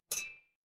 glass clink

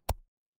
ui pop

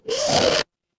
cartoon squeak

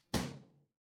impact slam

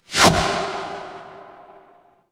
transition swoosh

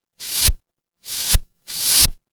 paper fabric fabric

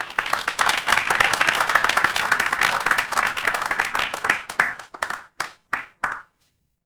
crowd applause

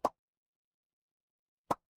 cartoon pop

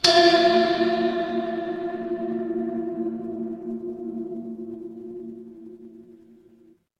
horror ambience dark

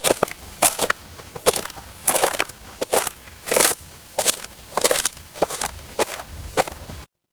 footsteps gravel

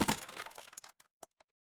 impact crash

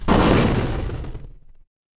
retro game explosion

